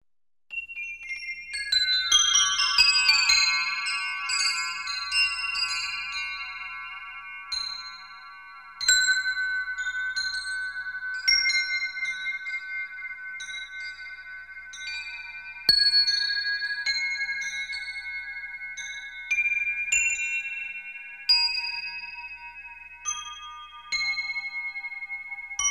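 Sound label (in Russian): звон колокольчиков хаотичный ритм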